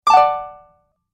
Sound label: Message Alert, beep